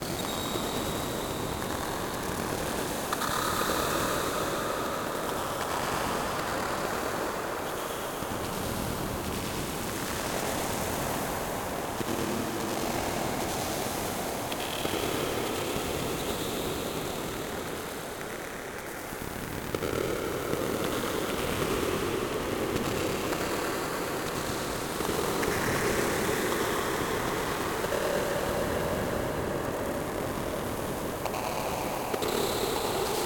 Radiation Planet, Distorted, Danger, Radioactive, Space, Sci-Fi, Planet, Ambience, Exterior; 344 Audio; Alien Atmospheres